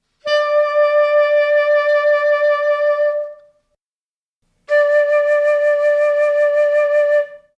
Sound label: Sax and Flute